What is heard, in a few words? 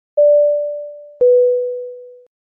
Airbus Seatbelt Sign